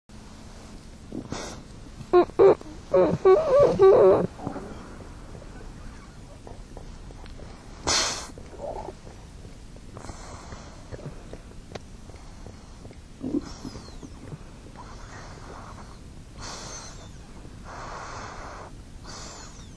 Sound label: Young Beaver Dreaming